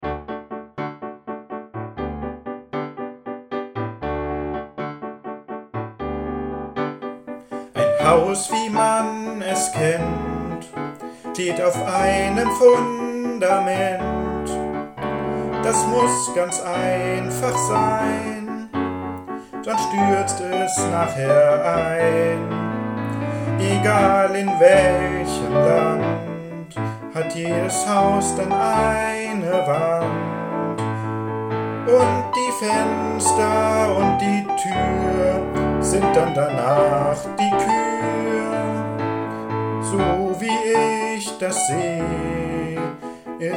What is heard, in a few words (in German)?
Nimmerimmer-Kinder (Gesang)